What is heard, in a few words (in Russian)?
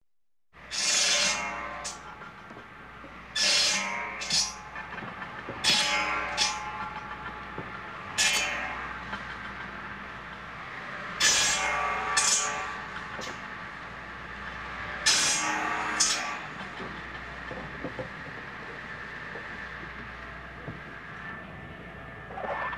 lesopilka cirkulyarnaya pila neskolko nebolshih otrezov